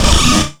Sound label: SFX, Drum & Bass, 160 BPM; Ueberschall; Drum & Bass Flash Trackz